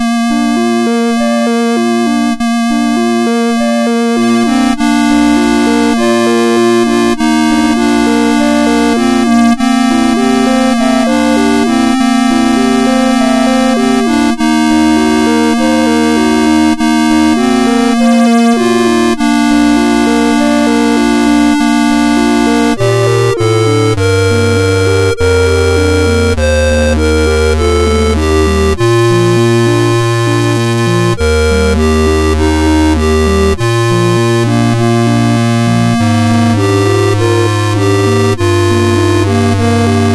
Ancient Gaelic Benediction, MOS6581R3

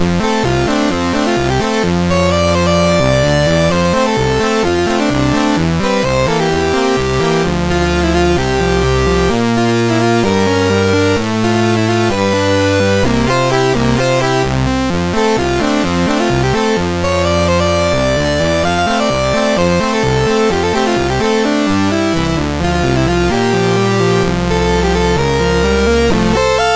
Applause, MOS6581R3

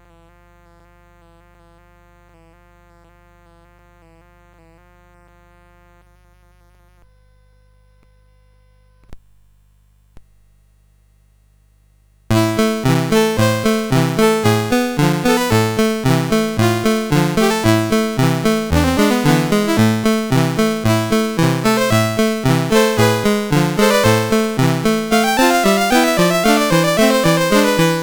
Hungarian Dance No5, CSG8580R5

